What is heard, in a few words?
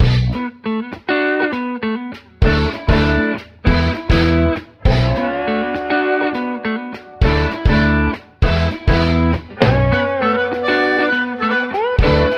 guitar keys hootinanny, loops; Phonky